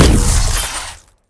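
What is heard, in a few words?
distant launch of laser projectile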